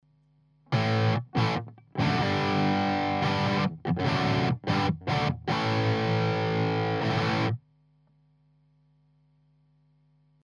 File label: EHX 5150 Clean Overdrive Strat SM57